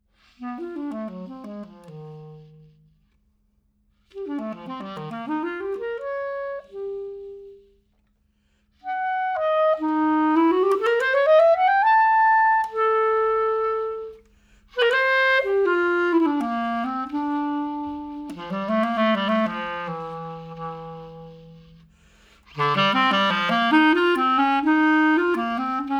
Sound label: polytonal mandel solo